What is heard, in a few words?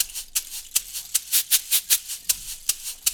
Roots Reggae; Sounds Good - Roots Reggae